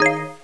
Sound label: accepted; svencoop; ttt